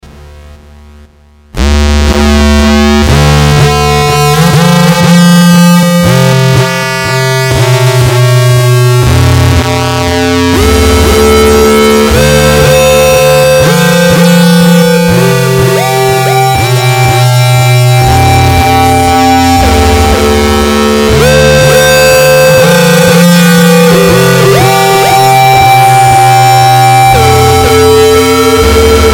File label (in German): Enzo Mono Synth Pur